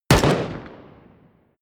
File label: pistol distant shot; schusswaffen